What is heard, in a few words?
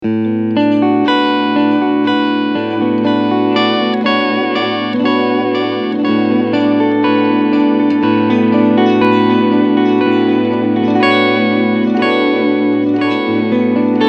Long Delay